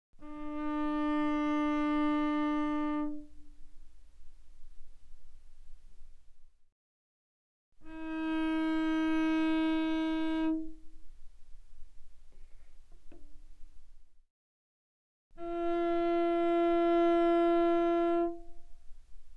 Cello arco mf sul D D4 D5; mono; Strings

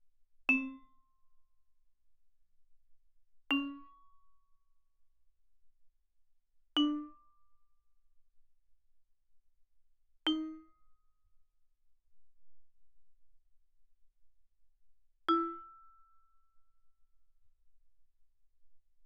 Vibraphone dampen ff C4 B4; Percussion

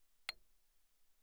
xylophone hard rubber ff C8; Percussion

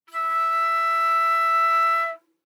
Flute nonvib ff E5 stereo; Woodwinds

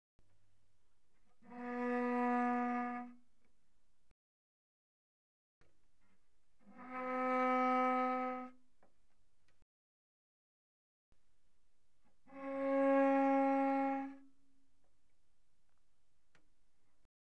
Cello arco pp sul C B3Db4; Strings

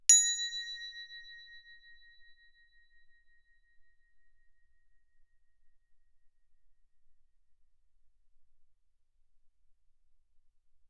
crotale Bb6 ff; Percussion; crotales

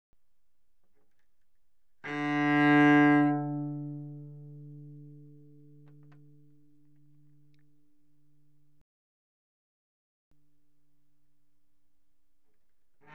Cello arco mf sul D D3B3; Strings

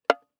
Violin pizz ff sul G E5; Strings

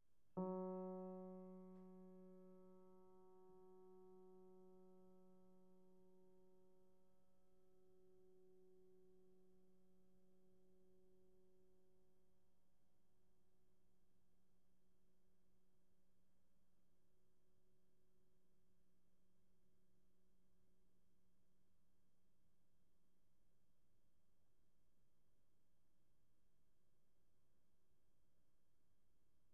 Piano pp Gb3

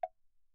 granite block, pp; Percussion; hand percussion; graniteblocks

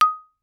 Xylophone hard rubber ff Eb6 stereo; MIS Pitches - 2014; Percussion

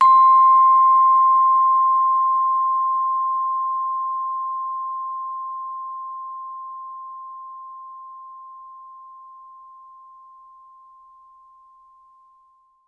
Vibraphone sustain ff C6 stereo; MIS Pitches - 2014; Percussion

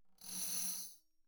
tambourine finger roll mf; Percussion

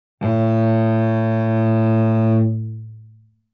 Cello arco ff sul C A2 stereo; MIS Pitches - 2014; Strings

